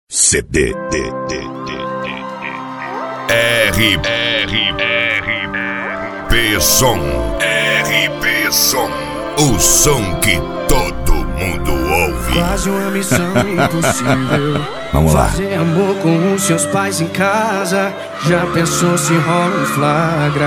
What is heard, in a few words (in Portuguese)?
sertanejo universitario